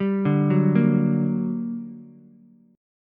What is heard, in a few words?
G Minor Major 7th G, Ukulele; arpeggiated; JGuitar